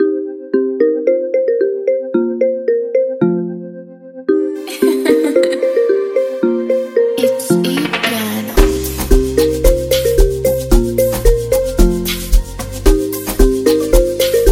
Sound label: ePianoh iPhone 16